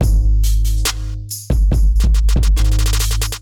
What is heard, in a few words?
Kit groove 134 bpm; MIDI Clips; Dreams Kit; Ableton; Factory Packs; Beat Tools